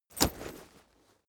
leather metal impact hard

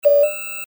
future communicator signal

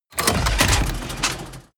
tank small damaged

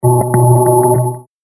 space bell

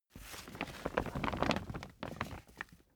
camping chair rig up

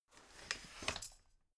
folding chair fold medium

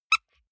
squeaker squeeze soft

